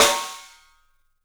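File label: PICOLO SNRS, snare, drums; 3D Sound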